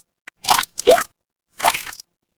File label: water splash